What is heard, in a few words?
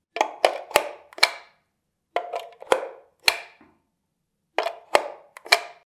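socket making sounds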